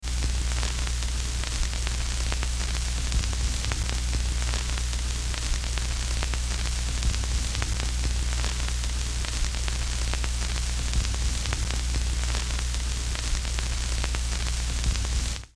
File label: Electrical Noise